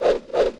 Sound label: twist, pumkin; mob